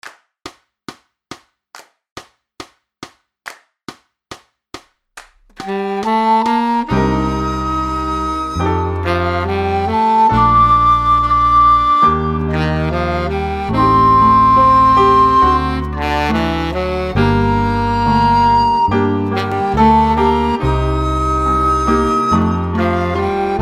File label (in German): ohne Schlagzeug